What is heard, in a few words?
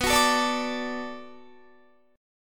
B Minor 7th B Guitar, chord; Bm7 strummed